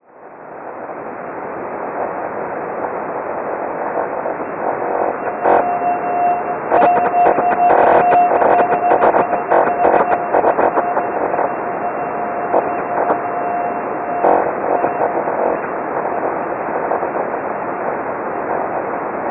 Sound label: Snotel 40.530MHz